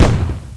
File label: Chain Gun Wall Hit; Defiance